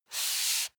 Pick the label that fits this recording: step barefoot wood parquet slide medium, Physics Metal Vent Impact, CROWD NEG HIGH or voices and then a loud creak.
step barefoot wood parquet slide medium